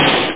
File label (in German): brett aufschlag